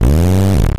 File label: Synths G; Vengeance Samples